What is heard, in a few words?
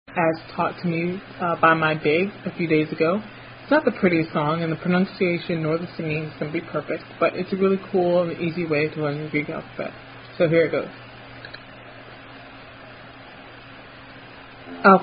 Greek Alphabet Song; The Greek Alphabet Song